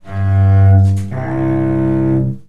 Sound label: note down octave note, cello